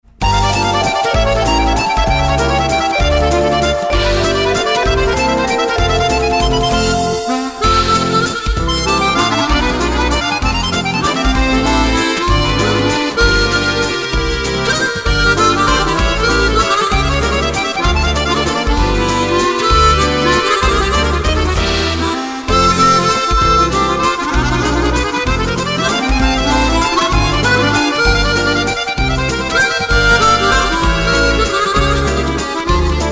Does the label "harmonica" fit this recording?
Yes